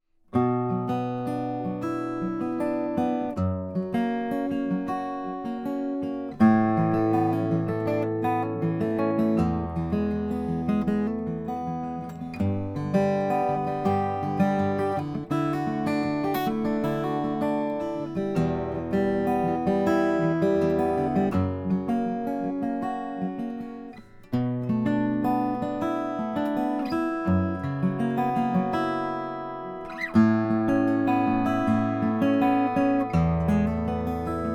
mcknight mini mac sunburst

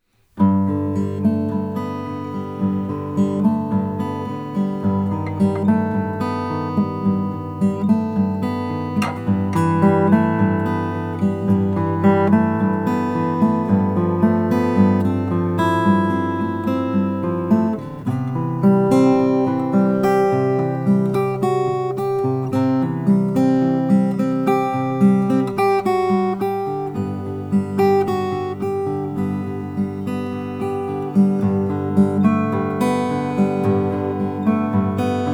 osthoff woodstock om brazilian ros

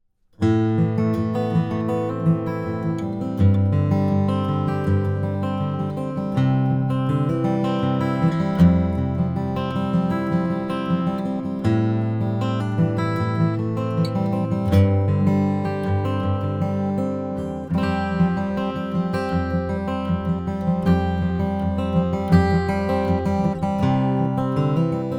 martin d 45 indian rosewood

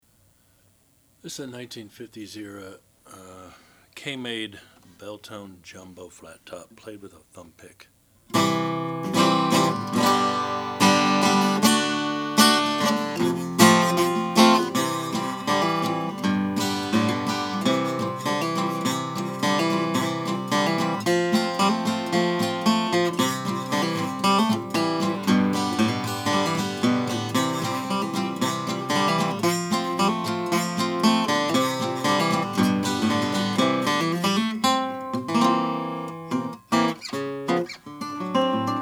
kay beltone red burst

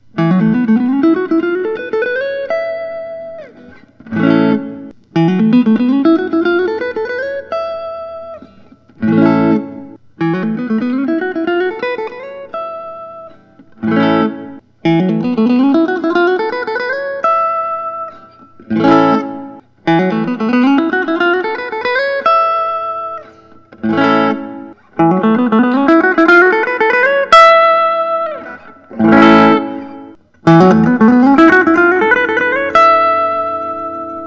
parker maxxfly set neck df8; tobacc

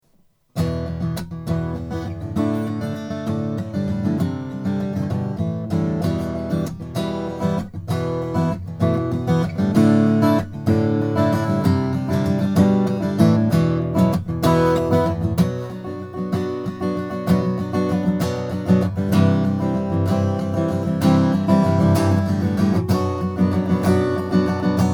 lowden o50c; with bevel; mastergrade

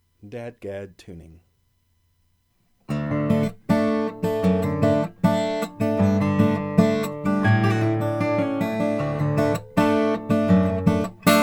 froggy bottom h 12c adirondack top

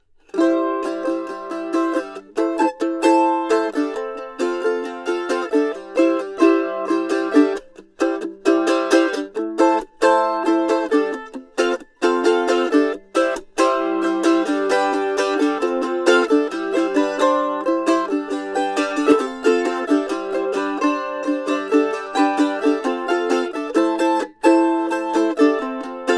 collings mf5 deluxe v varnish finish

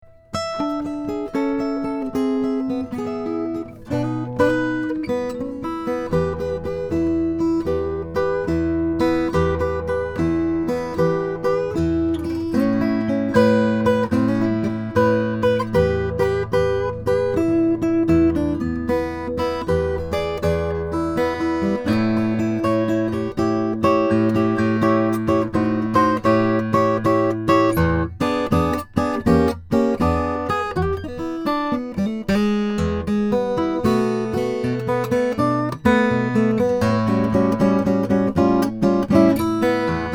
bruce sexauer ft 15 es brazilian rosewood

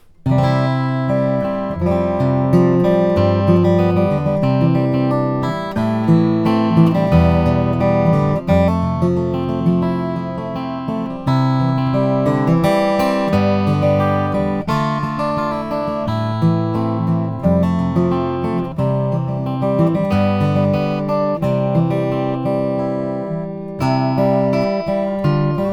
rainsong dr1100n2

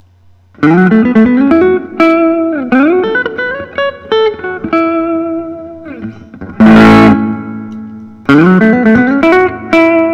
gibson custom aged

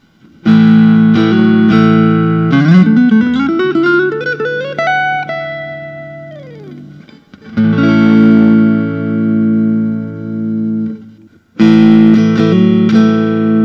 lentz traditional s brazil; daphne blue

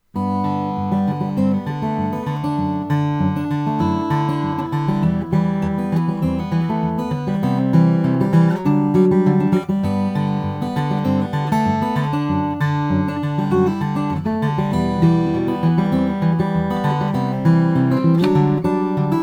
mcpherson carbon fiber sable black

